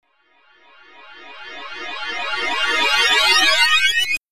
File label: Magic Harp